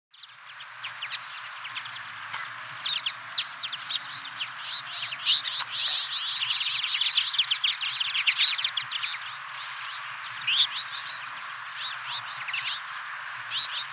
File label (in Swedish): vinterhampling, fagelljud